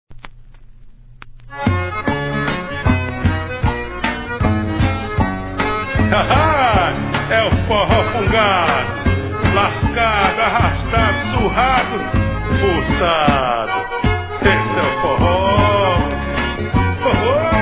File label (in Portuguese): forro